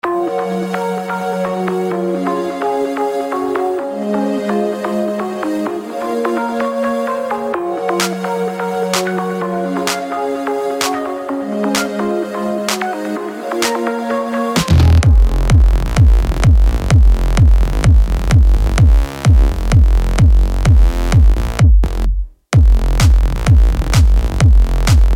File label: Hard and heavy buzzing bass